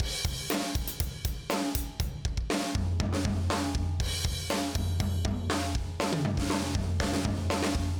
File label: comp76